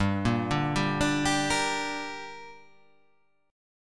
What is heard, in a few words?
G Minor G down, chord